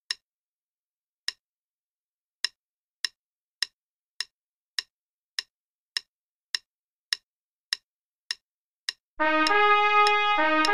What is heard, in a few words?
Play-a-long, click, qn=104; March of the Tin Soldiers; trumpet